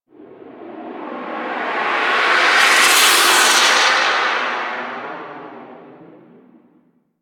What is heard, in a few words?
Gas Air Gust Short, Ambience; Warframe; Corpus Gas City